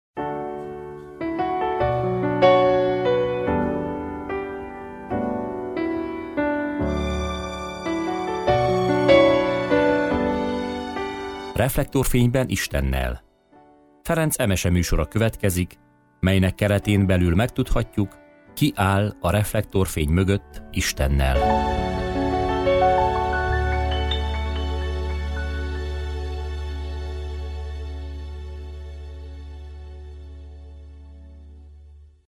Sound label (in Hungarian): Szignal; Reflektorfenyben Istennel